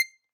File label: Hohner Super64 Vib rel C6, Harmonica; Aerophones; Releases; Free Aerophones